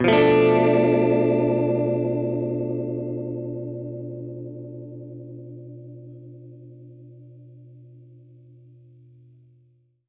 Gretsch Chord B7b5, Guitar Chords; undercover samples

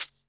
Shaker; Equipped Music; Loungin House

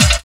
BD, 160 BPM; Ueberschall; Drum & Bass Flash Trackz

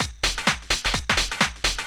Dance Grooves

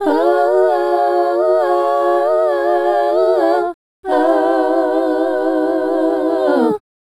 Female Group, Vocal; Sonic Foundry (Sony Creative Software); Vocal Toolbox

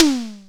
SNARE, Simmons SDS5

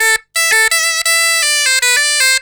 BOMBAR, Celtic Flavours; USB Soundscan vol.42; AKAI

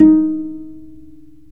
STR VC PIZ, Chamber Pizz, String Master Series; Roland - String Master Series

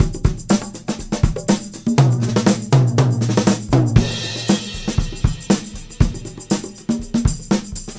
Combo, R&B Drums

